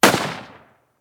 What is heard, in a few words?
bumm